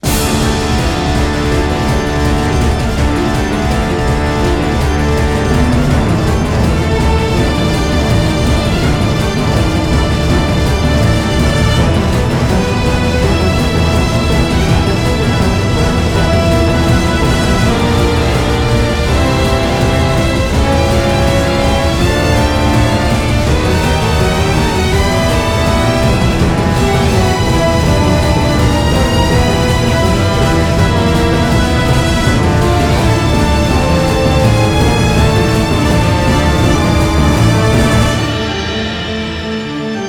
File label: Guild Suppress Intro